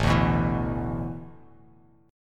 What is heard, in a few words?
A Suspended 2nd Suspended 4th, Bass Standard, Asus2sus4 chord; strummed